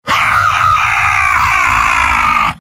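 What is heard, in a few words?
soldier m pain critical death; tf2; garrysmod; mvm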